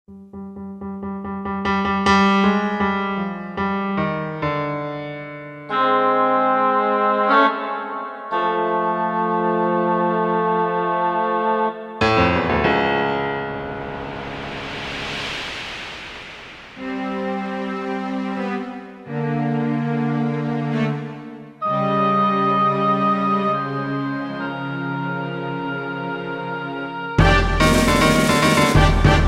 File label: Suspense Cue